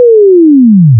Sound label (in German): ton; akustik; physik